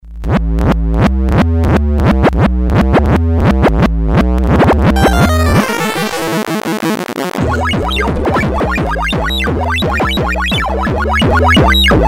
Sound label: 8 bit beat